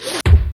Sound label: Arrow hitting target